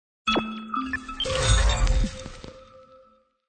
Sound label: Digital Transition Logo